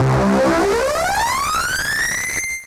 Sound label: Tune Charging Energy